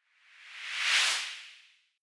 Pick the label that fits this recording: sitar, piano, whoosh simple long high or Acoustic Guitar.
whoosh simple long high